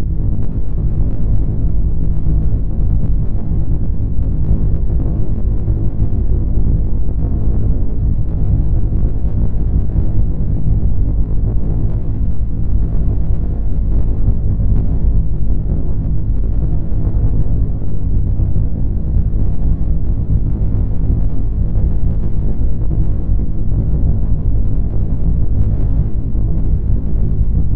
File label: Retro Ambience